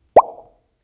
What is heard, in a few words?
bubble pop sequence; three